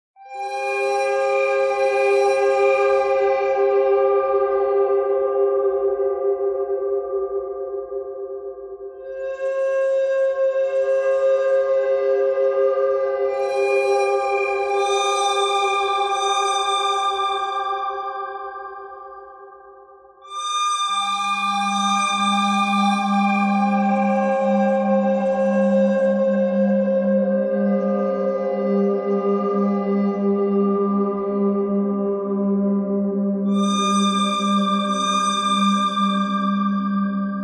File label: bird thread simple